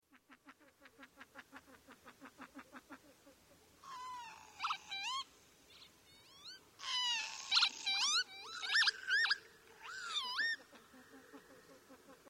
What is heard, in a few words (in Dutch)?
Kievit